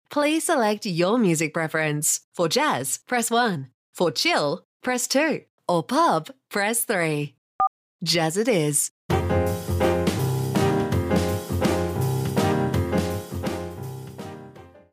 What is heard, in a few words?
Juke Box